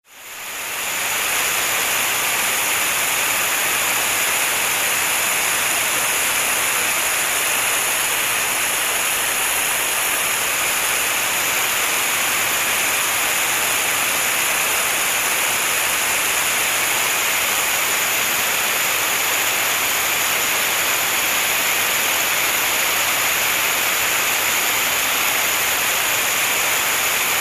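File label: sounds of water from a fire hose water pours from a fire hose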